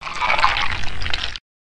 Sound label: slug crawling